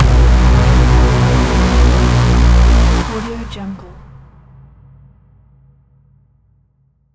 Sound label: braaam strike; esmteam